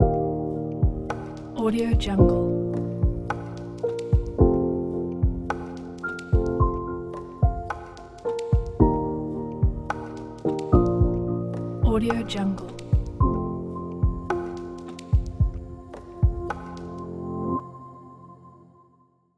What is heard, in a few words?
atmospheric piano logo